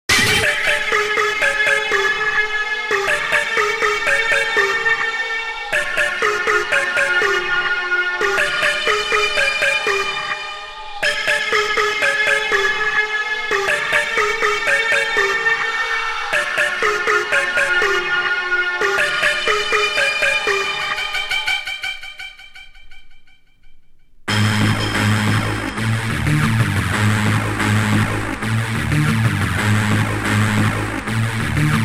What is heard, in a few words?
Jackhammer, Dance